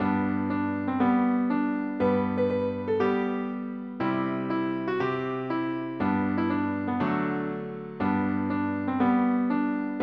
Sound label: piano fast; fast tempo; PSE Music; PlayScore version